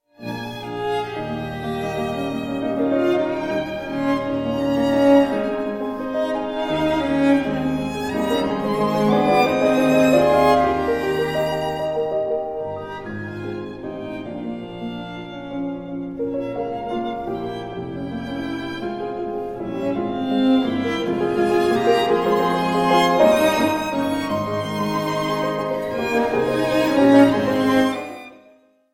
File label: piano trio in C minor; felix mendelssohn; op 66